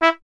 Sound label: BRS TP E 0, Trumpet; Miroslav Vitous